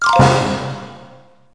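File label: scale crash, music; Wired for Sound Pro CD; Aristosoft, Inc.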